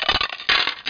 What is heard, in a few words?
dice; Juegos